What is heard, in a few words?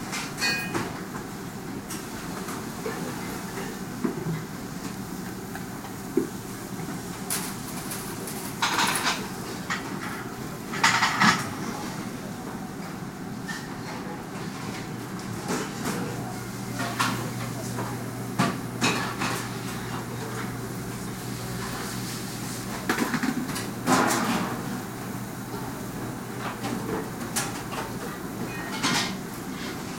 kitchen Amb; hellskitchen